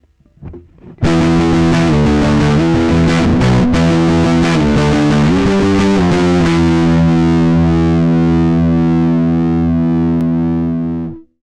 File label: riff distortion raw